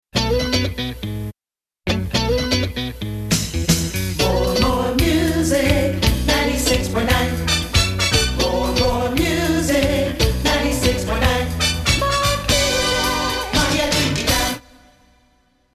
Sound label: Jingle, radio